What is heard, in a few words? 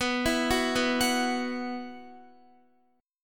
B Suspended 4th, Guitar; Bsus4; arpeggiated; x,14,14,11,11,13